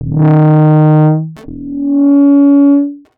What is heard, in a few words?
2 notes